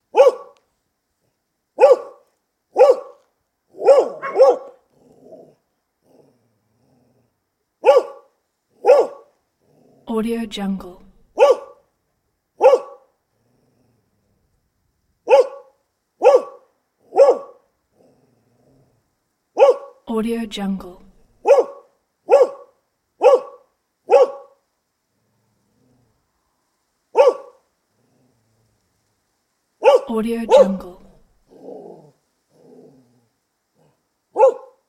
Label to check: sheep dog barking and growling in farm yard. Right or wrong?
Right